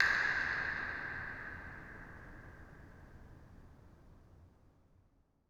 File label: incinerator dirac